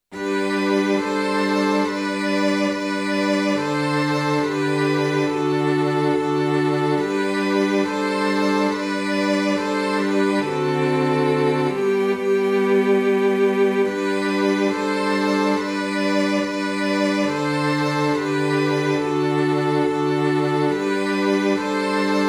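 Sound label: Choral Unusual harmony Strings; BWV147-6の元Choralによる(コラールらしからぬ)月並みなハーモニー 主はわれにいます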